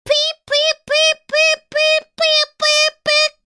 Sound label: beep; svencoop; FZSaySound